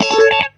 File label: GTR, Roots Disco; USB Soundscan vol.32; AKAI